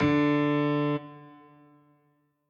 piano riff intro

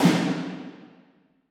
orch snare

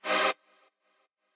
chord

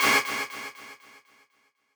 stab

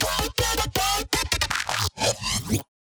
wobble

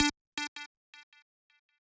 lead

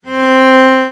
Violin

